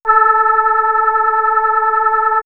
Swirls Juno Voice, pads; X-Static Goldmine

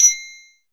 Chime, Metallic Percussion

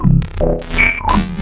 philter; Sonic Foundry (Sony Creative Software); Ugly Remnants VOL-2

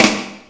Snares; Drum Hits; Nu Dance Electro; Zero-G; Soundclash

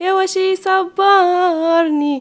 GIRL, GIRLVOICE, Arabian Traditions

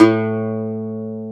Segundo Domra A1; Planet Earth; Digital Sound Factory Planet Earth

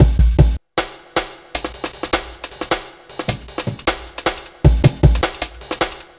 155, breaks

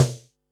snaredrum, Pearl SC-40

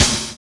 DOUBLE BD; Univers Sons

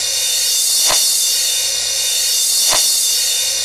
crash, drums; CMP - Analogon

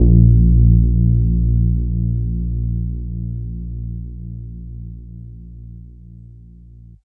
Transine Bass, Drum n Bass; KJ Sawka; Drum n Bass & Beyond

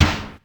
Snare, Ensoniq ASR-X Pro

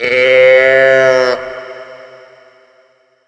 COW TOYS; USB Soundscan vol.27

